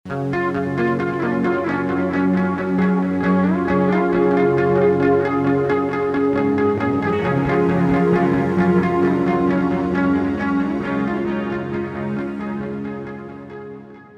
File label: Pipe Choir